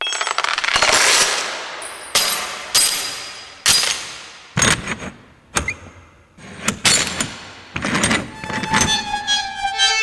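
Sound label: chain latch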